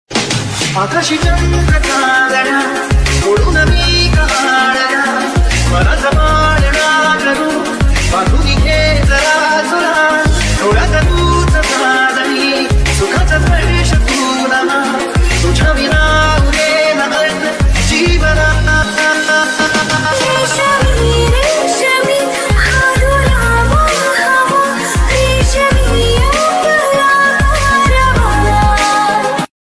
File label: marathi ringtone, romantic ringtone, dj ringtone, remix ringtone